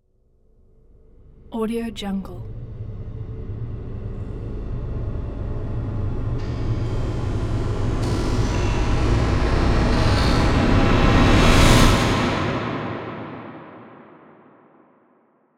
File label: rising event